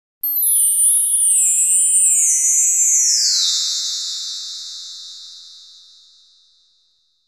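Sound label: Magical Glissando Descending, bells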